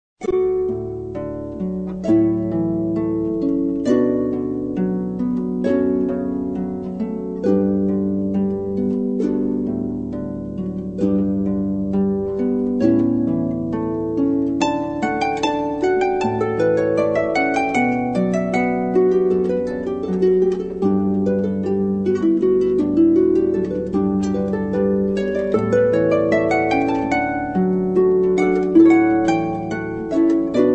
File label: solo harp; pachelbel canon in d